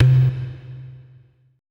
E LOG C, ETH DRUMS

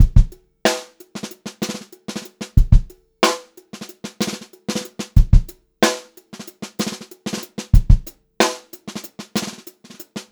groovy beat, Funk-Groove Drumloops; USB Soundscan vol.38; AKAI

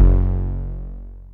OB VOX F#2; Hollywood Edge - Giorgio Moroder Rare Synthesizer Collection; OB 4 VOX

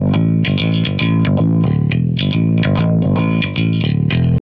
Bass, Wah; Bass X

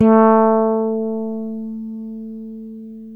FRETLESS

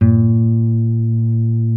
B NYLON, Guitars

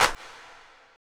CLP, Disco Fever drum samples; Best Service ProSamples vol.48 - Disco Fever; AKAI

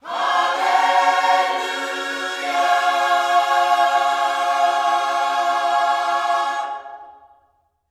HALLELU, Gospel; Spectrasonics; Vocal Planet; HALLELUJAH

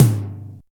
HEAVY TOM HI; Drums In Your FACE!

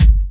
BD, Dance Mega Drums, HIP

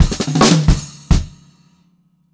FILL, Funk-Groove Drumloops; USB Soundscan vol.38; AKAI

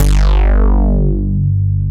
WET BAS, JD800 BASS; East West - Global Sounds

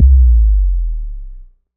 DD BAS, Disco House; Best Service Dance Mega Disco House

